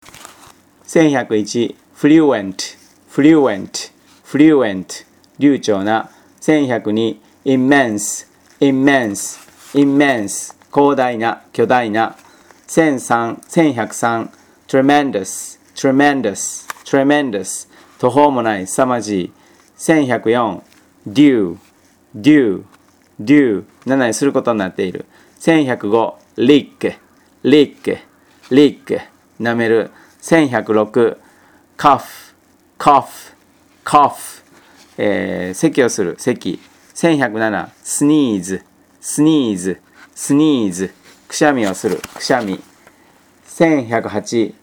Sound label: tango